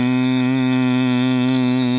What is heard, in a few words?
hum C